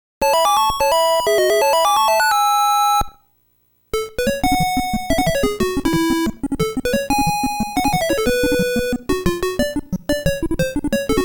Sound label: Fanfare ~ Race BGM; Game Sound Museum Namcot; Family Series